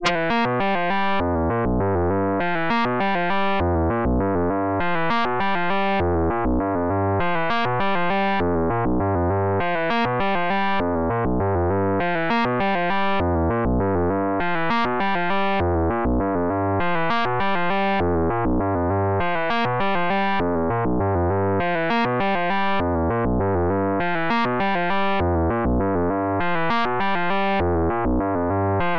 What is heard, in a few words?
Synth Riff Ringtone